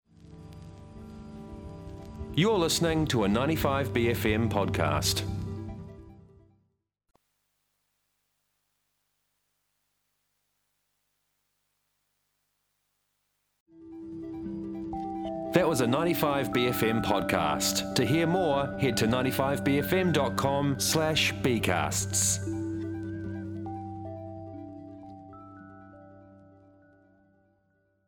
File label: Good Groove